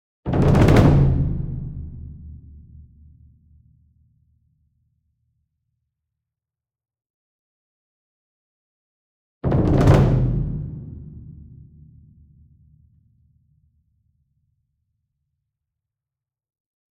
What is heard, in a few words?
ENSEMBLE DRUM Bass Drum Concert Roll Medium; boom library; Cinematic Strikes Construction Kit